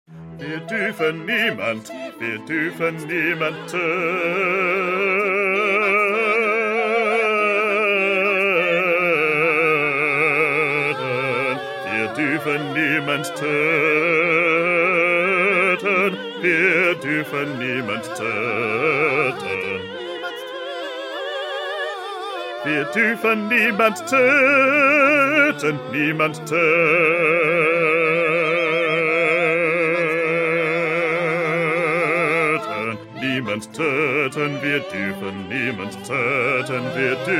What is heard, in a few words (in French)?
PETIT CHOEUR BASSES